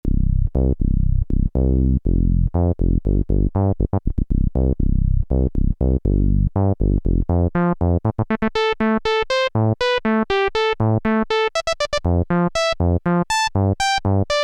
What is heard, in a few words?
korg dw-8000 filter lpf q050